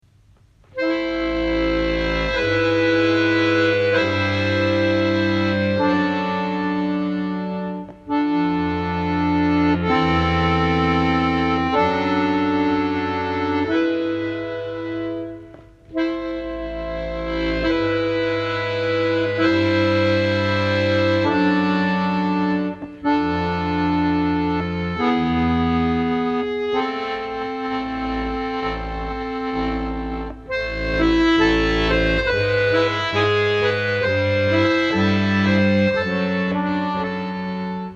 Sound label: Chant For Peace Akkordeon; Komposition